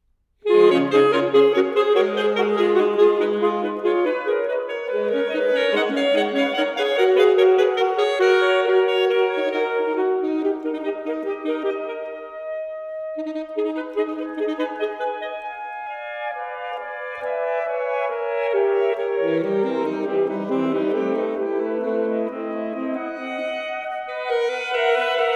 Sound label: sax; canonic suite